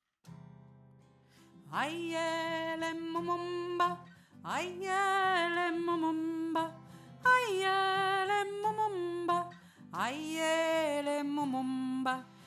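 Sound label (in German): ayele mumumba hohe Stimme, afrikanischer Zwischengesang; Dreistimmig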